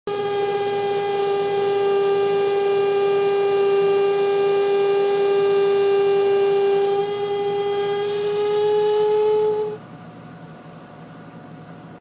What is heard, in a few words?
ac howl jeep